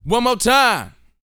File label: VOX F, Vocal Planet R&B & Dance HIP-HOP; ILIO